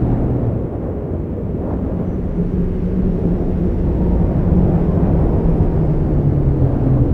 emitter wind entrance

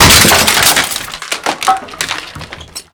explosion wood debris close; Explosions

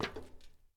walk metal; Escape from Tarkov

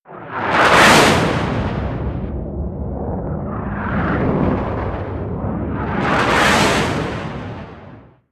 ambient port; World Of Warships; port3d

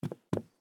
turn wood; Escape from Tarkov

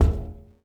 glass walk

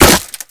impact wood; Modern Warfare

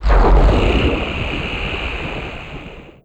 sdv start, vehicles; Call of Duty Modern Warfare

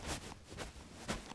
Move Sack; Civ4 warlords

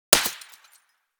glass distant; Escape from Tarkov

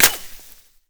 impact foliage; Modern Warfare

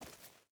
footstep burlap dirt walk; Rust

